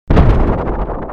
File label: Bullet Scifi